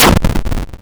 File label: explosion, eclatement brique; AlphaRaquette; godot